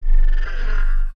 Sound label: Tech, Weird Side Samples